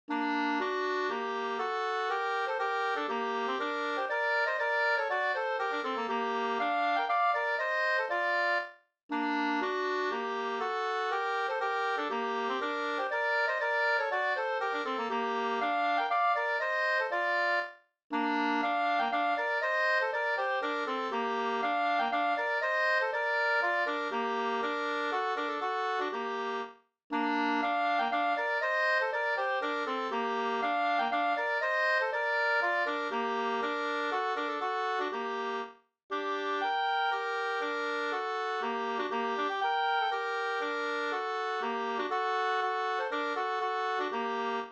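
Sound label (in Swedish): polska, tvåstämmig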